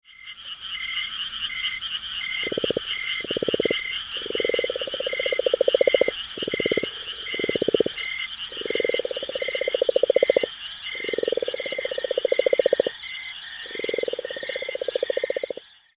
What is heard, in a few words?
Calm Interlude